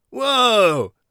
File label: whoa, warrior voice; Warrior Voice Pack